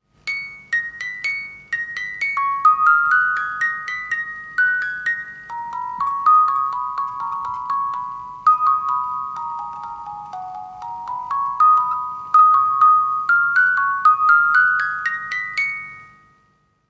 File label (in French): canon version 2, boites musique